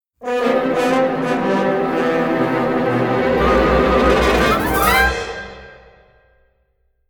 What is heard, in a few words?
Witch Disappears